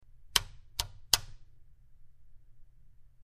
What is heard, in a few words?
Rotary Dial Turns, switch clicks